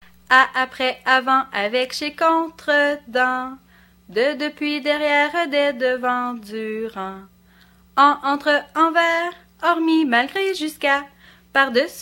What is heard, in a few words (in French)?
chanson des prépositions